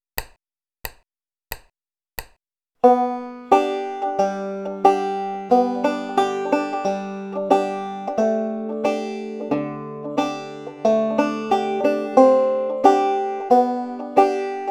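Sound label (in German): liedbegleitung thumb lead style, banjo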